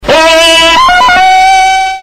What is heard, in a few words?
tarzan